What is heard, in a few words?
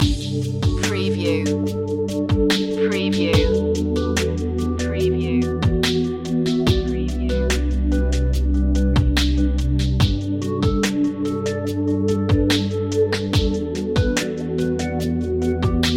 square drops loop